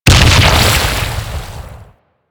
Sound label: Pirate Cannon Explode; Warframe; Warframes